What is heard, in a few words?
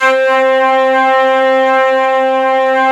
ST-ORG, STRINGORGAN, Yamaha VP-1; Music Store; 90's Sample CDs